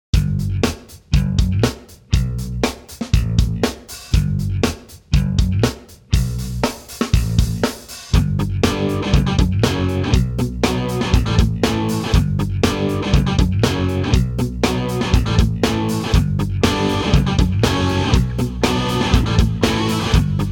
Pop Punk Instr

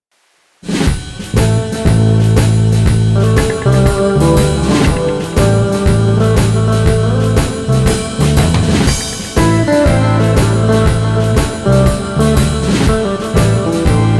Jazzy Blues Lounge

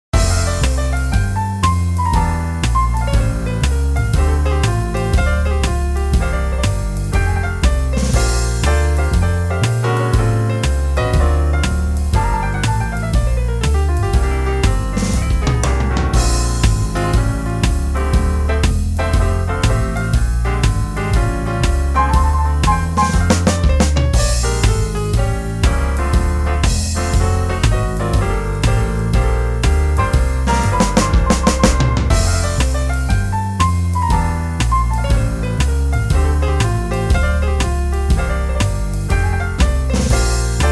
Jazz Piano Trio Laidback 120

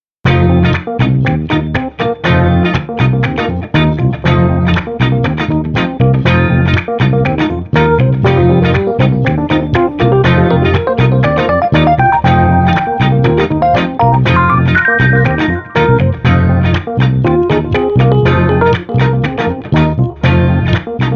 Street Funky Keys Trio 120

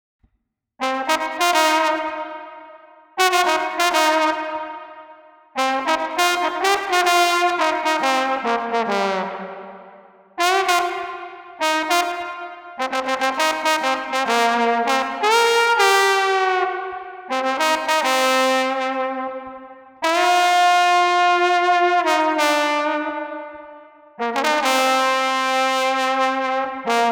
Street Trombone Moody Solo 100

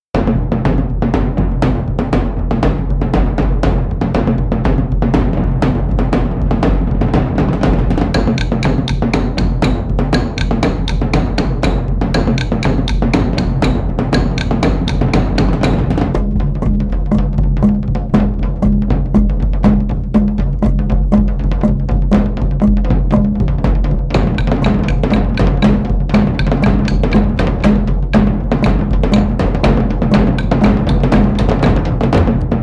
Aloha Hawaii Percussion Only 120

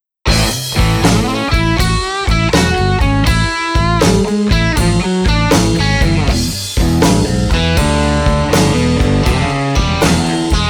Blues Rock Groovin Instr 142

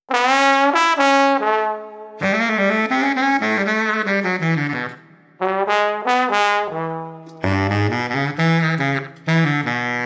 Street Brass Q and A 90

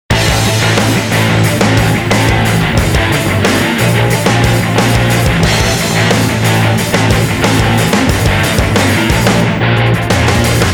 Heavy Metal

